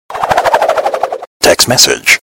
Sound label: text message